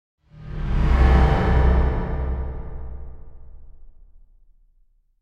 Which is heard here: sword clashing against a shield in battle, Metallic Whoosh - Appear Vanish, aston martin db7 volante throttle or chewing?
Metallic Whoosh - Appear Vanish